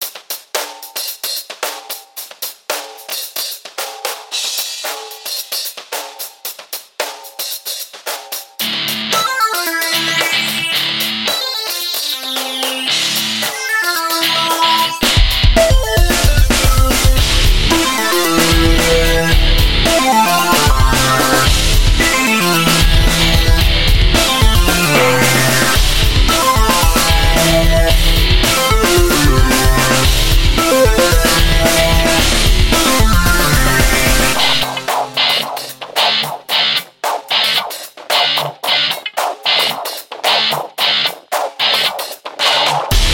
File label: Remix